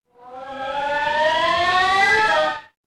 transition riser

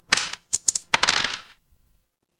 impact dice roll

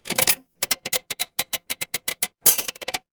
mechanical slot machine

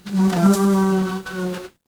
animal insect

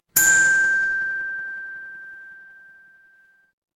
instrument chime bar

